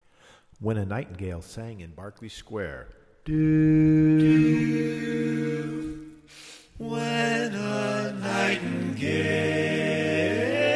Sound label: Lead